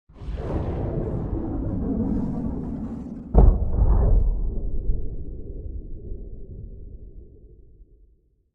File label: Skybox Portal Closing